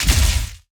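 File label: Sci weapon Barrier Blast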